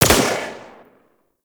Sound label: Pistol Shot; Warframe; Tenno; Tenno Bard Pistol